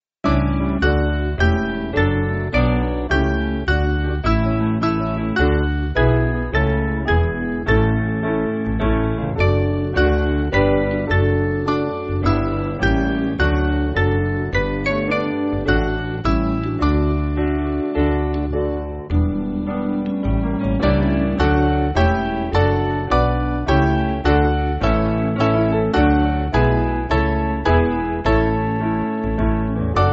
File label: Piano, Music Backing Files for Churches; Mainly Piano (CM)